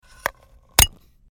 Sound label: can pull up